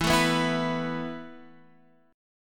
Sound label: E Suspended 4th E down Guitar Half Step Down